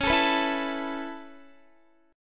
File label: C sharp Minor 7th Sharp 5th C sharp Guitar; C#m7#5 strummed